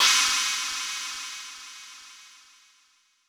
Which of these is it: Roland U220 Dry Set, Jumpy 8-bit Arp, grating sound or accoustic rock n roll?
Roland U220 Dry Set